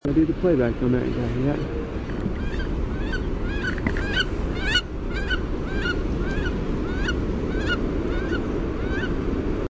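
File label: Wood Duck hauk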